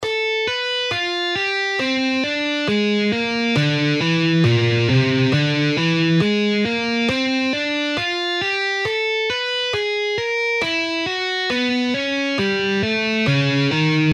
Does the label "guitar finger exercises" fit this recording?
Yes